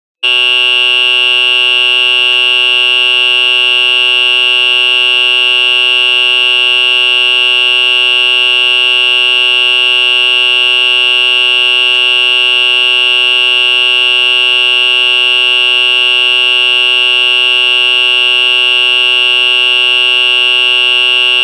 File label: horn